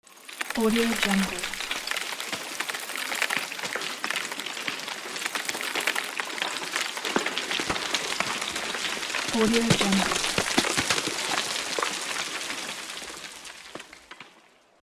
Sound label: rock fall